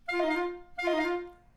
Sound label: clarinet